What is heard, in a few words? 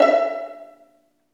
String Vlns Pizz